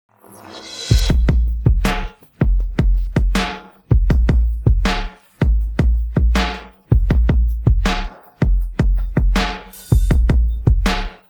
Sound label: drums pattern